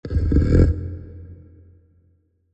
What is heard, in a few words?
kaiju adult pre chomp; biogenesis